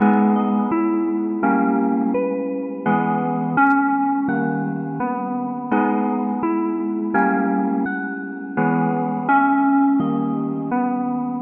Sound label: sour notes, Electronic Loops